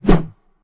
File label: whoosh; Smite; god Lancelot